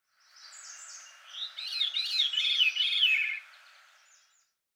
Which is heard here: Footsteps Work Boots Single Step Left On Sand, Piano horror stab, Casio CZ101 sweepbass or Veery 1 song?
Veery 1 song